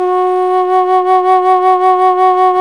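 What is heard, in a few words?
Alto Flute vibrato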